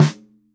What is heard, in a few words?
RSD MF, ROCKKIT